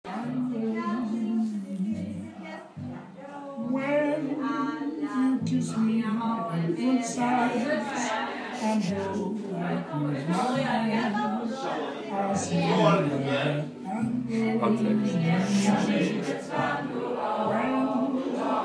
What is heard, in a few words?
drunken foreign people singing; the animals went in 2 by 2; at the old crown